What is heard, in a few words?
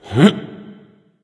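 grunt lift, male; smite; voicepack; rama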